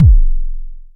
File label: analog deep, Kickbank; Ableton Foundation FreePacks